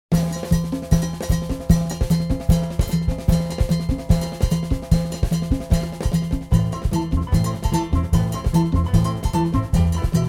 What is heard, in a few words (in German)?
Kubanischer Marsch